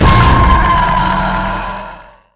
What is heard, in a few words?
Slam; Danggo; Sins Mod